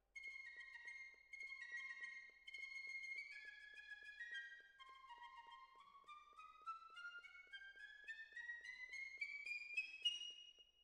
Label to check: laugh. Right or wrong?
Wrong